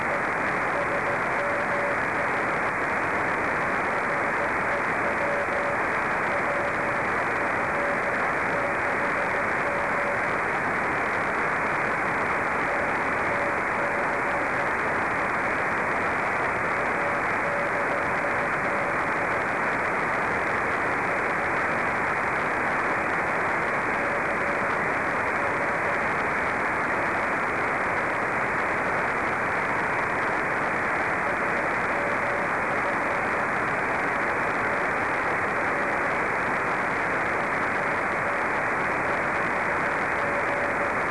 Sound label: 432mhz